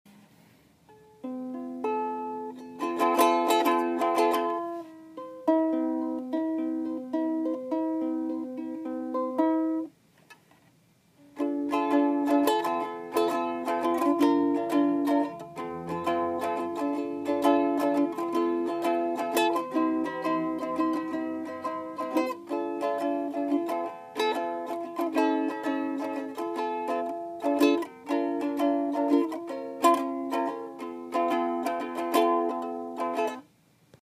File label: Ukulele, playing with a ukulele; location: my dorm; Uke Practice